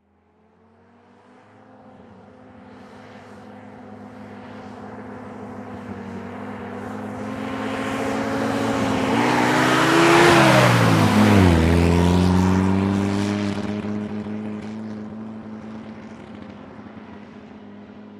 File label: Army Jeep Fast Accel B